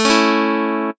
chord A

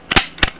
Staple

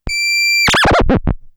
theremin on

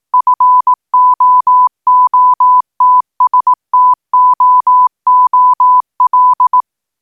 footstool 18wpm backwards gsm

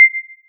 ting

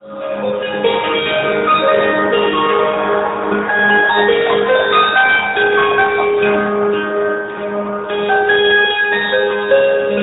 autoharp; st clair

